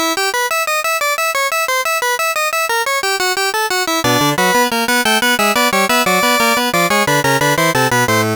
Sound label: bach fugue; Deluxe Music Construction Set